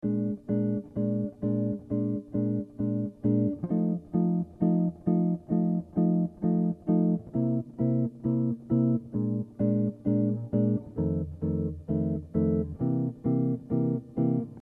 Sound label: blues